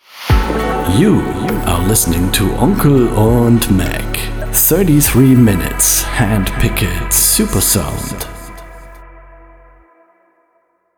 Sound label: Jingles; Onkel und Mac